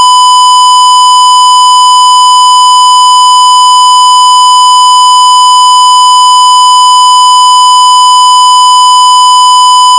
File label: 1kHz square